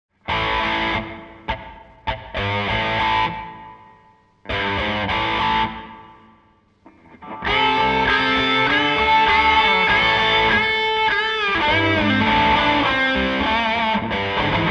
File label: rock riff; kytary